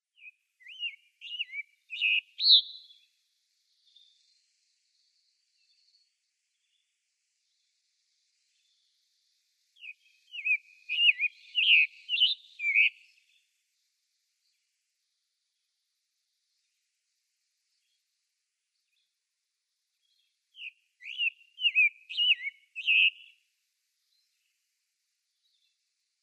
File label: Scarlet Tanager song